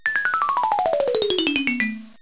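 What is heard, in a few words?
Elevator Down; Treasure Galaxy (1994); Learning Company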